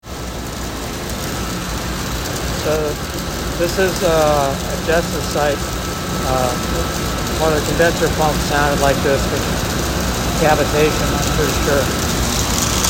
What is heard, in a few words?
pump cavitation